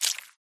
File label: squish